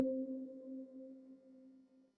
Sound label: swap